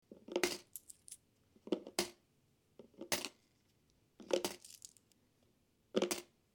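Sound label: monety v polnuiu kopilku, coins in full piggy bank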